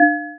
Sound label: Syn Dr, Overloaded Synthetic Drums; style kits